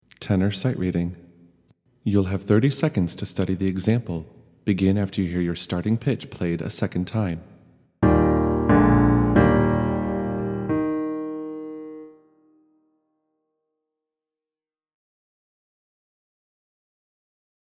Tenor; PWCS All-County Auditions

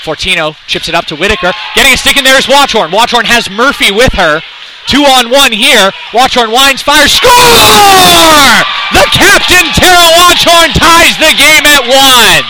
Goal; vs BRA

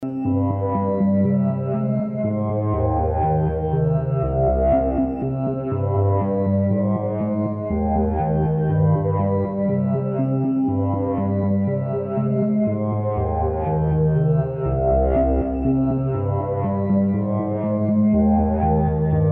moogy

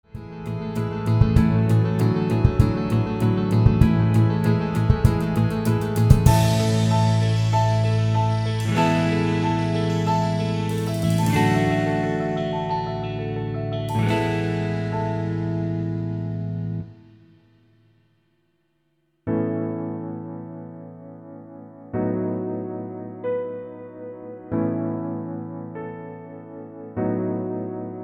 (Instrumental)

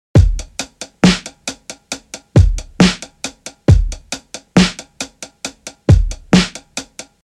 67 SLOWED TO TAPE DRUM LOOP

